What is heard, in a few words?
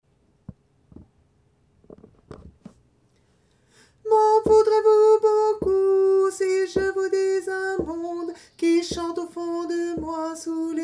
basse